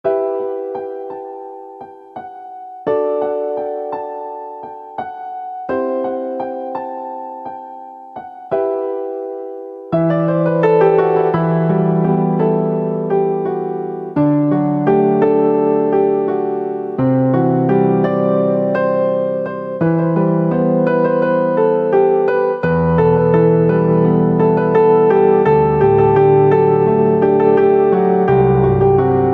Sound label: Piano